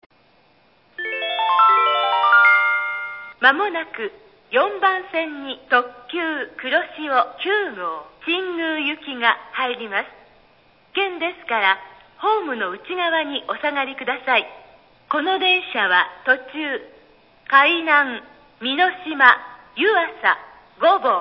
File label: track4, eki; wakayama; kuroshio 9go; nishinihon